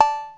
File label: BELL, DRUMS; SoundFactory; SMPMANIA